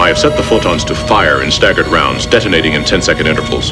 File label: fire photons